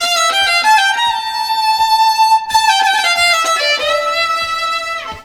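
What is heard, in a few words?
Balkanish Traditions strings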